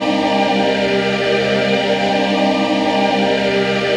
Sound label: PHASE PAD, ATMO PADS; Best Service; XX Large